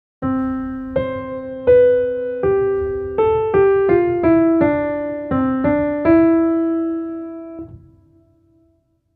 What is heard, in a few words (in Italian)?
solo melodia